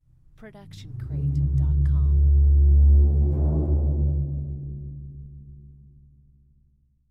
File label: stringed drum